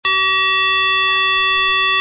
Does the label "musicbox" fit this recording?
Yes